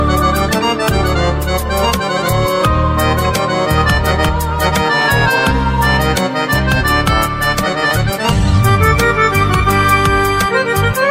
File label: Accordion Piano